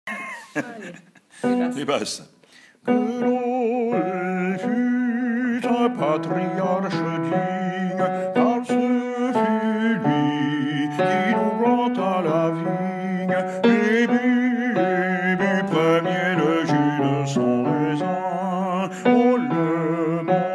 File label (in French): basses